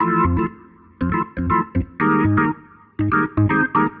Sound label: Jazz Keys Organ 120 F, Chordy Loops, 120bpm